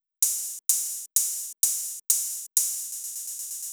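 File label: Drum Loop 128BPM open hi-hat add; VEC5 Drumloops; VEC5 Multi Loops 128BPM; Loop Elements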